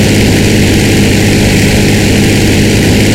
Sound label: stuka rear, engines; War Thunder